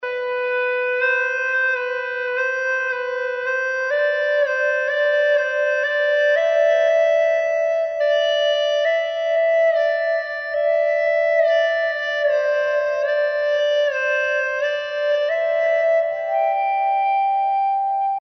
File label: quiet reflection